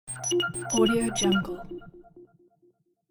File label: alien warning signal